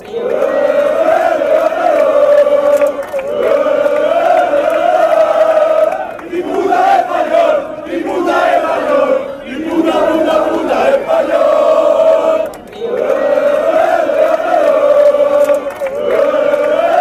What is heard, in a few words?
lo lo espanyol fanchants